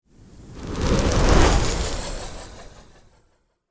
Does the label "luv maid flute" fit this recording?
No